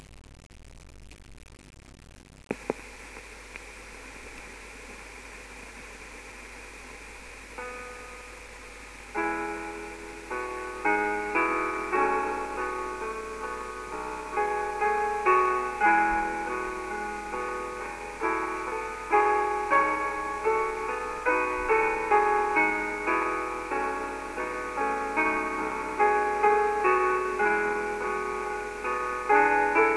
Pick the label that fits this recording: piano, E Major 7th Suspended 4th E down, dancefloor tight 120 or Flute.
piano